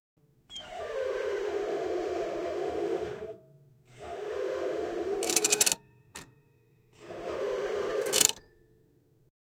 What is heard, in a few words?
display digitals slide